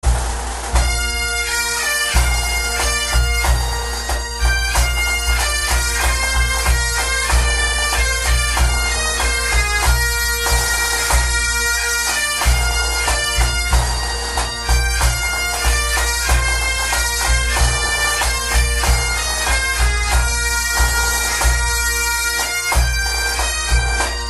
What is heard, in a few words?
Bagpipes